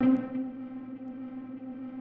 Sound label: Instrument